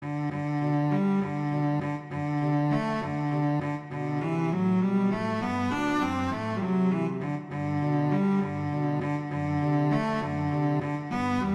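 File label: Duet, Rondo Allegretto, viola; Mazas; Duets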